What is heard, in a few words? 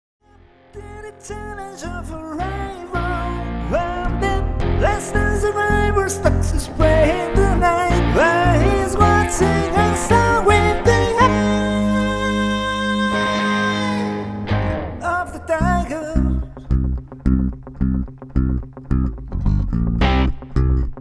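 without drums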